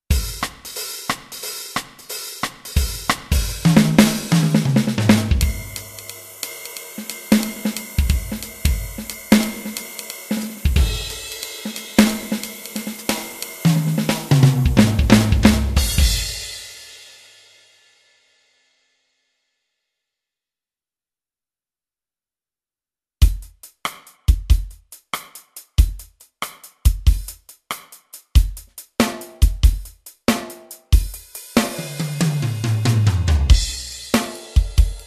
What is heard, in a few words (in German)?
TD20 pattern mit Kits